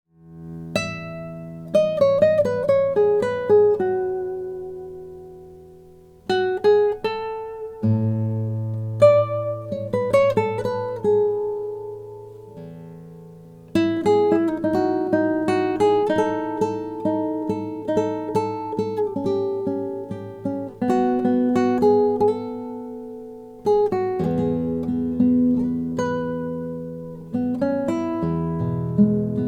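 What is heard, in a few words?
marchione classical african blackwood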